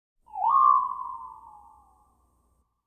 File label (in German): ufos vorüberfliegender meteorit; Science Fiction